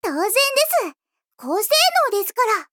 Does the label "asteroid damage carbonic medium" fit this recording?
No